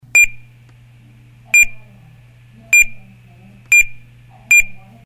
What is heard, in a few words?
Carrier Control Timer